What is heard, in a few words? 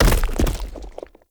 Pillar Stone Medium Destroy Chunk; Objects; Destructible Pillars